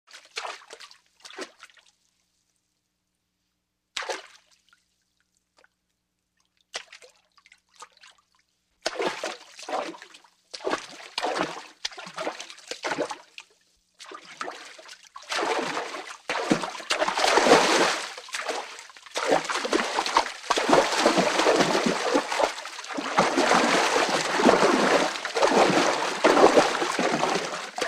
zvuki shagov cheloveka po vode

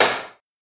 zvuki dai piat

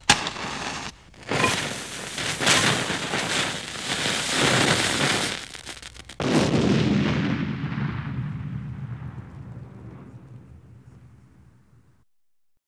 zvuki bomby i granaty

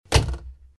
zvuki dverei

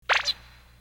anime zvuki

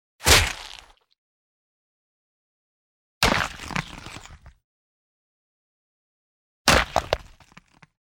zvuki padenii cheloveka

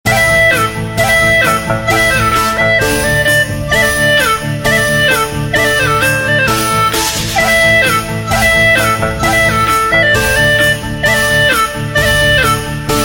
originalnye ringtony i uvedomleniia nokia 808

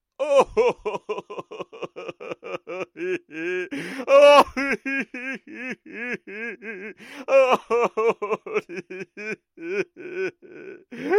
zvuki muzhskogo placha

